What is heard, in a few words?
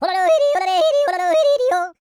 FAST YO, World Voices; ILIO; Vocal Planet